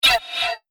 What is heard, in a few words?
Laser Vox, sci-fi laser sound effect; Laser Sound Sound Effects; Andrew Fleming